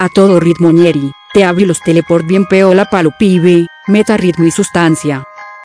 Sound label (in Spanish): ritmo; uboa